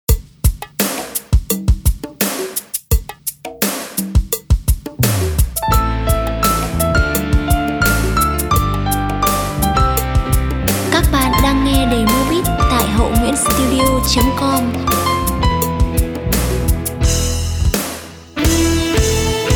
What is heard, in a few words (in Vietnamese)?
beat